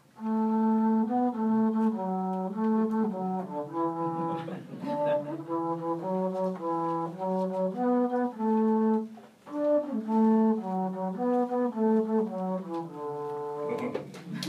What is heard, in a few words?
bass; gakki